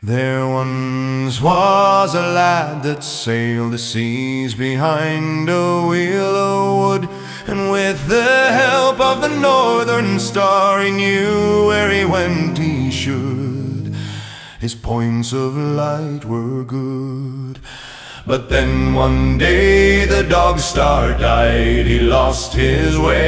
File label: Space Shanty